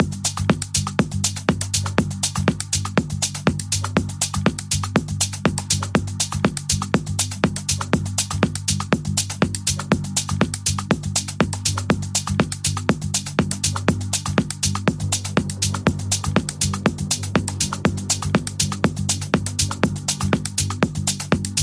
melodic house techno dj mix; xbeatradio

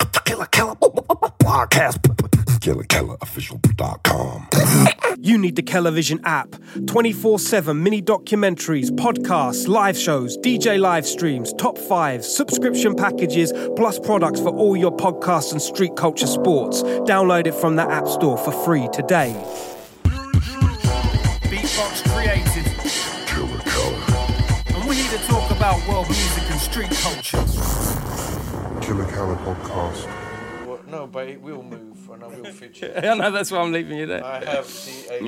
drum bass

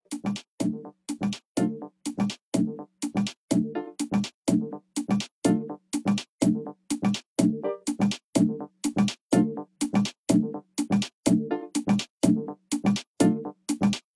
techno

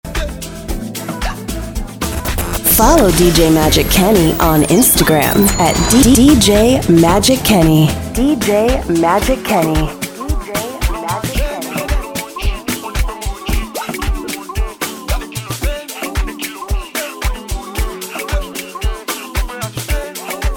afrobeat dancehall amapiano soca set live; atlanta caribbean weds

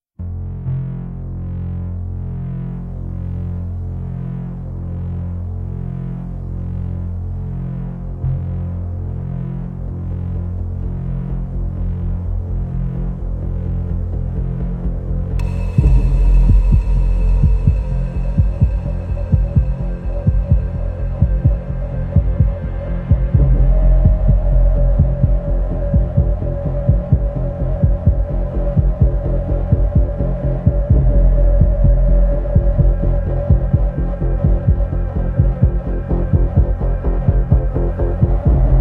techno live sets anima techno; ibiza

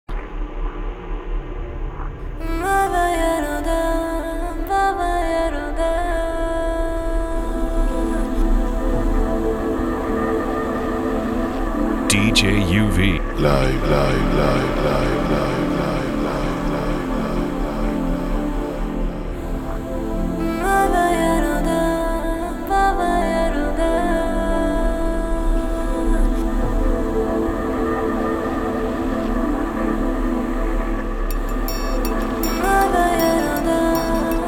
chillout downtempo grooves; pitalfm heartbeat set 1

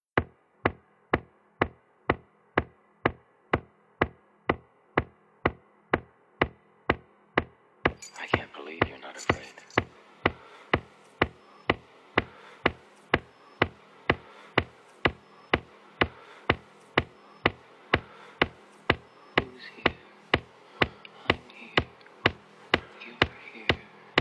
techno mix

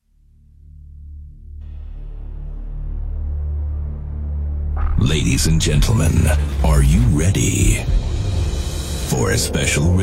techno live sets; power groove radio show

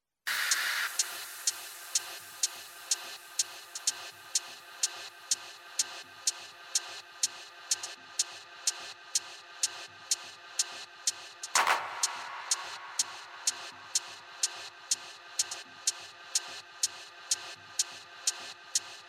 techno live sets, tekkno open air; tekkno am teich

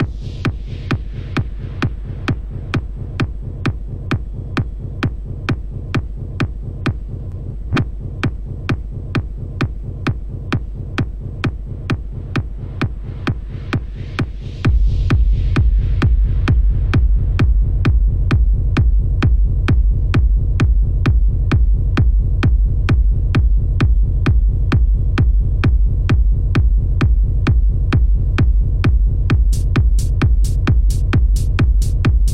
techno live sets, fresh techno vinyl mixed

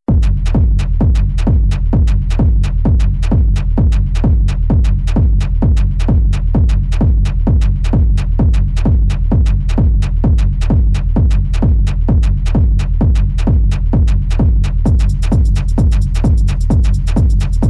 techno live sets techno set

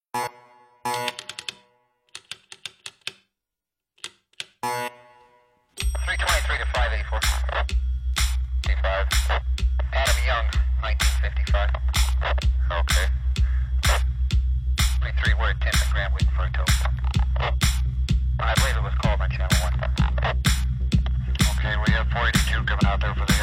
techno live sets, techno session

